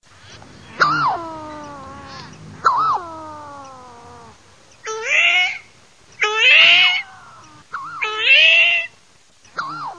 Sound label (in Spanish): PATO DAL COLLARE